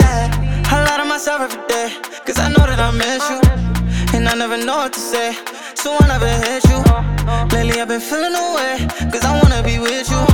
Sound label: Pop